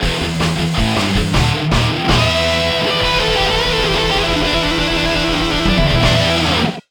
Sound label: Battle ~ Draw